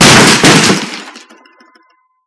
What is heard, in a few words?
door smash; ambience